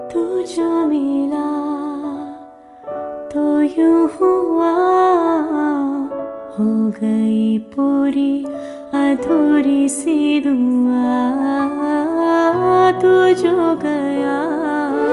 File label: Female